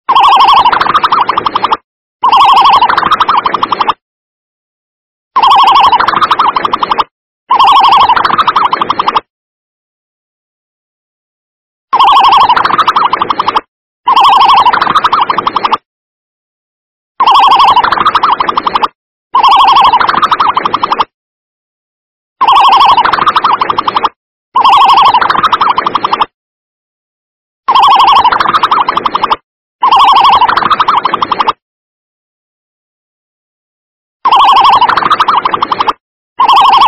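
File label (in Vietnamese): Tiếng con đà điểu kêu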